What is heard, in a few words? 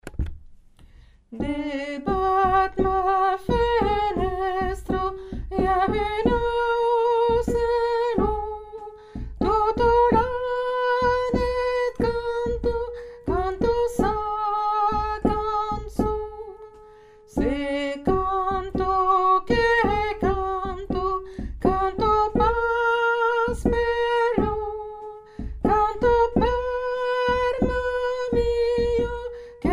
soprano; se canto